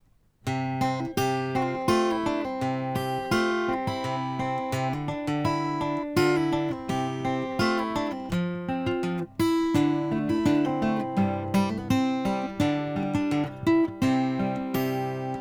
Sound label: stereo guitar example